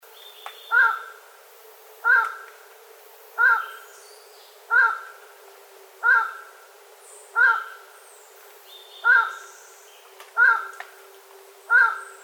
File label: Highland-tinamou, پرنده وشم کوهسار